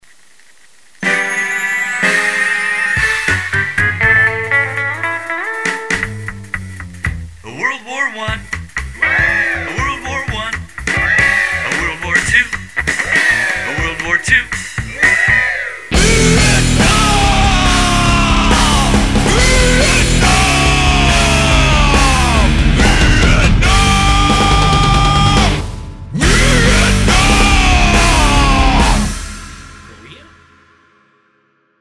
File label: white jazz